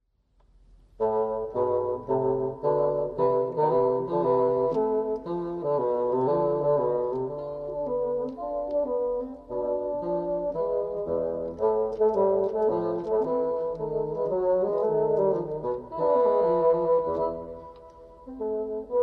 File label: duet